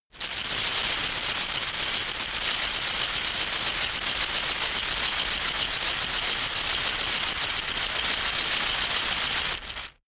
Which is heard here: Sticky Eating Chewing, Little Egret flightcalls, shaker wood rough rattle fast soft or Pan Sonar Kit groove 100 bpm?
shaker wood rough rattle fast soft